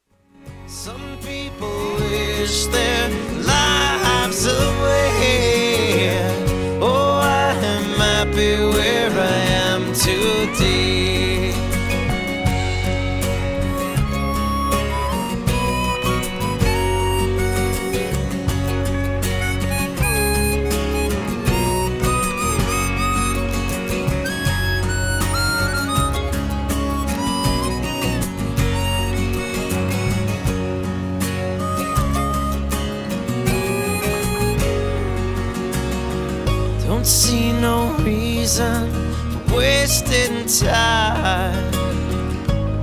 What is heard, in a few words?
Streetcorner Song